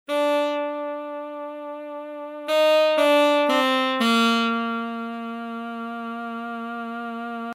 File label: Sax